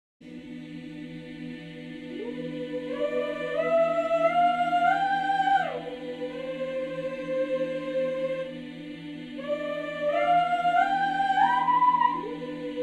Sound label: Agile Legato; Strezov Sampling Freyja